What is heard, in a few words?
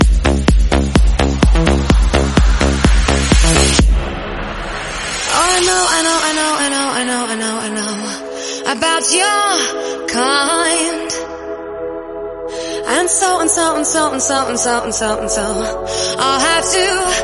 127bpm Dbm